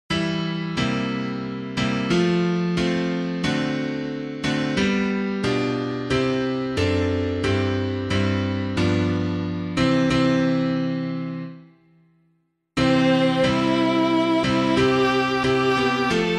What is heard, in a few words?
piano